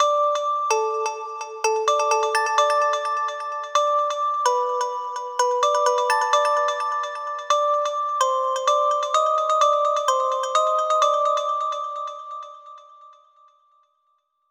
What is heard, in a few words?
Melody; super crush k.o.